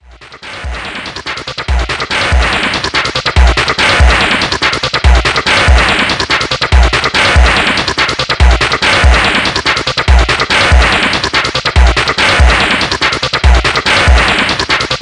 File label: Techno Club